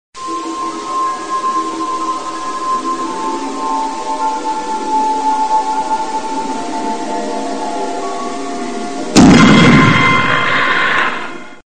tono misterioso

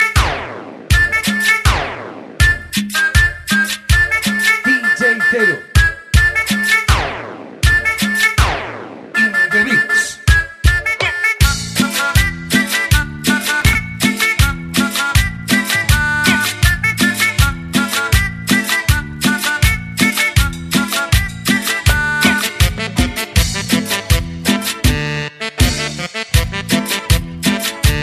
cumbia penas; Latin

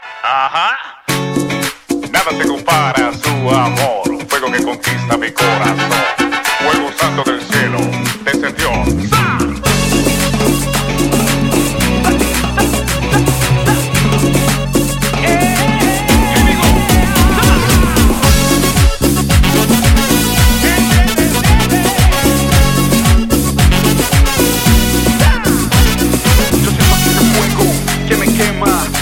cumbia; Latin

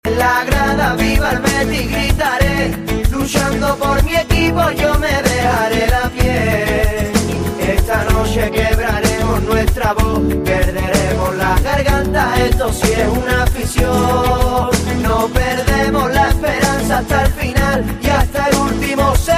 himno; Betis

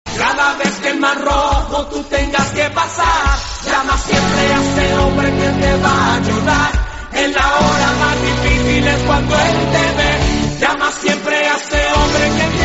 himno de victoria

